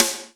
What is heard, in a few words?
ATTACK SN; Big Fish Audio; Synth City